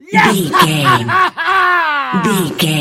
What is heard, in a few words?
voice male battle laugh